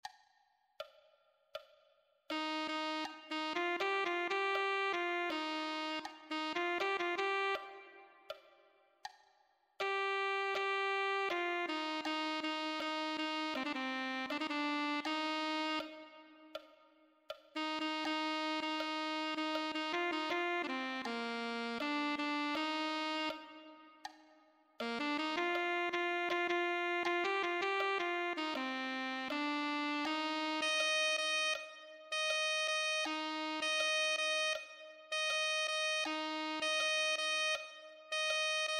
alto saxophone

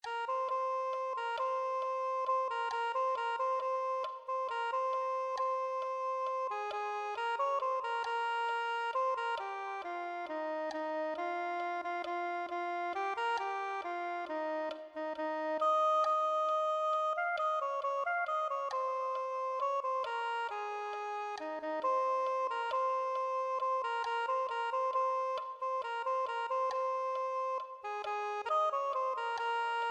soprano saxophone

